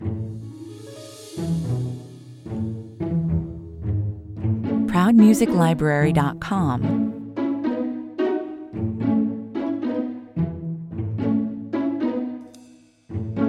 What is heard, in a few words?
less lead drums perc